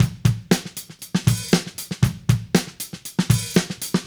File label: Drums, 70s Analog Funk; Sonic Foundry (Sony Creative Software); What it Is!; Good Times